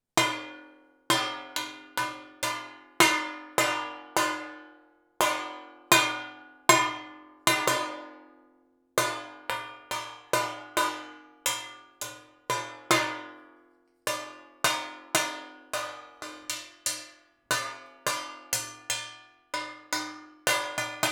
fémtálca fakanál kerek végével való ütögetés hátulról; Perkupa; hangkeltés

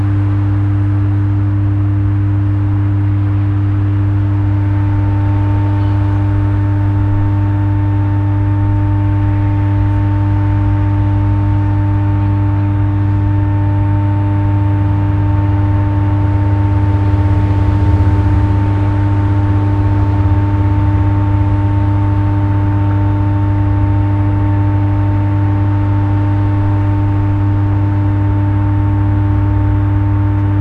áramátalakító; trafo; vizafogo

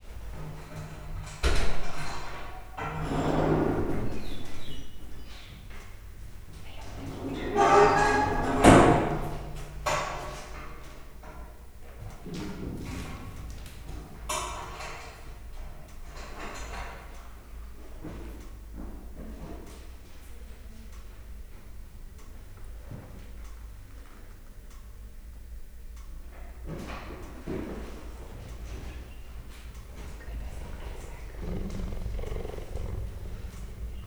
alvóhelyére beengedése a vége felé megszólal, gepárd; szegedi zoo 2011

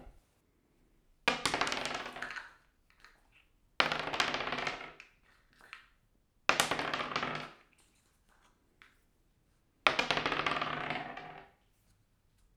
négy kocka egymás utáni eldobása, dobókocka; Perkupa

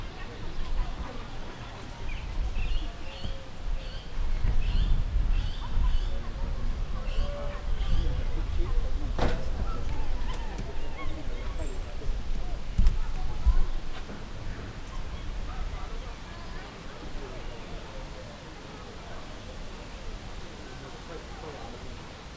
magas vékony hangocska ritkán, apellacsuklyásmajom; gyori zoo 2014

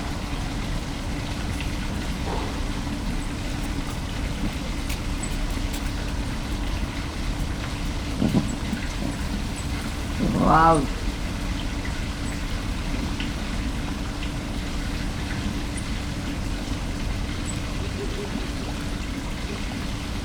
éjjel egyszer halkan, mocsári hiúz; jaszberenyi zoo 2016

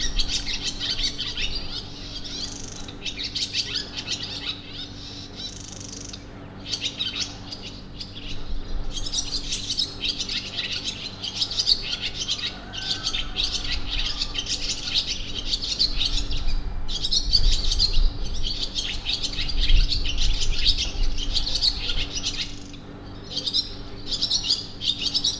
hajnalban egyszer belefúj a szél, parti fecske; allathangok; termeszetben; potamia

